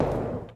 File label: step metal